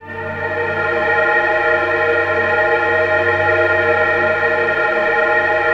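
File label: ATM HORROR, Production Effects; Best Service - XXL Production Effects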